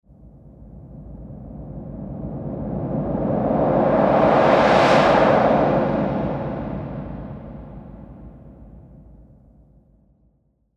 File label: wavish noisy riser